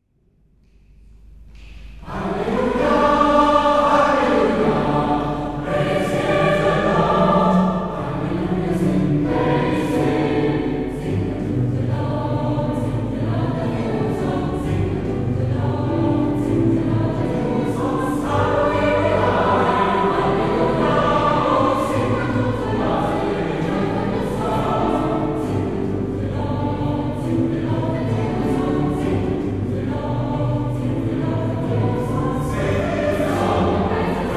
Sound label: Psalm in Jazz